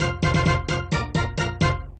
bonus mu, jingle; bonus multiplier; WILLIAMS; INDIANA JONES; Indiana-Jones-Original

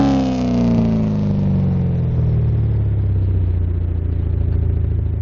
car decelerate; BALLY

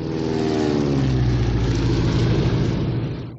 plane; WILLIAMS; INDIANA JONES; Indiana Jones Original

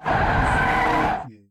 car screech; BALLY; sfx; TWILIGHT ZONE; Pinsound; Twilight-Zone-Full-Reorchestration

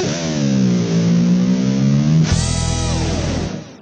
combo; BALLY; CORVETTE; Son Corvette Original

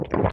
swallow water; sfx

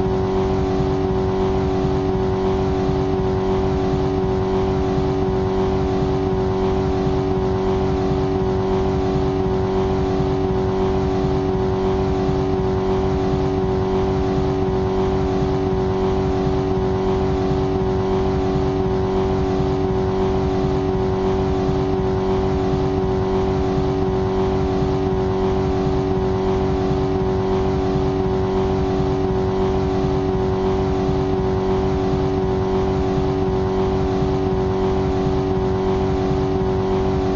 engine ambiance; BALLY; voice; CORVETTE

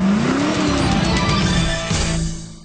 vrroooaooum; BALLY; CORVETTE; Corvette-Original

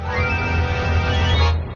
multiball start dramatic jackpot chance; jingle

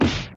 punch hurt; sfx

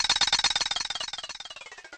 start button without credits; sfx; WILLIAMS; INDIANA JONES; Indiana-Jones-Original

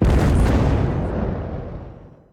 boom; BALLY; TWILIGHT ZONE; Pinsound

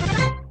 indy rollover lit; sfx

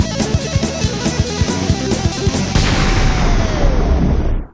failed; BALLY; CORVETTE; Son Corvette Original

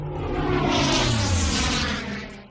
sound of a flight; sfx

